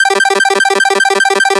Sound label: 8Bit 150BPM 1 Bar Arp G; Antidote Advent